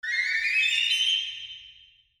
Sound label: Cartoon run away